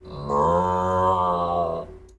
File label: cow calm